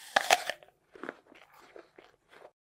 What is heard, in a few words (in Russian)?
yabloko